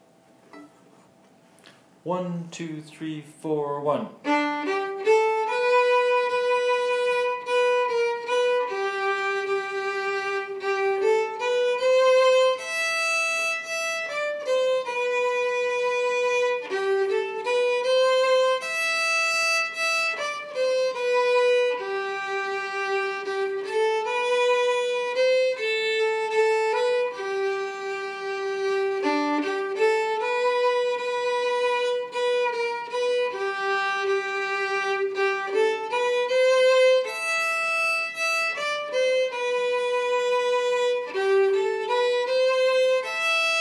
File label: violin